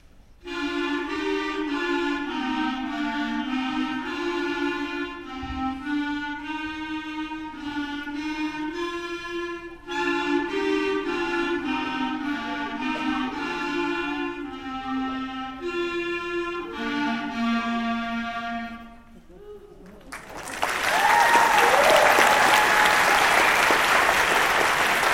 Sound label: Clarinet Feature, Beginner Winter Concert; Knight Band